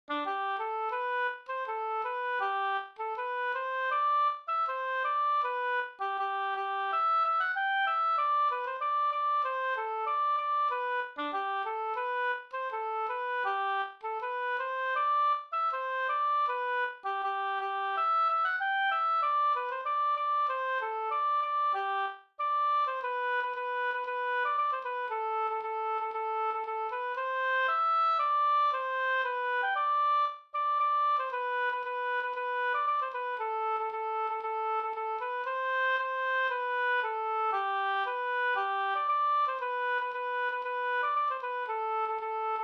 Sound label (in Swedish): folkdans, nl; De vleegert